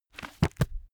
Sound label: heavy book shut